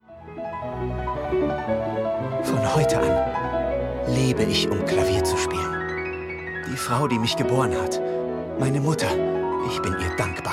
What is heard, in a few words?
Piano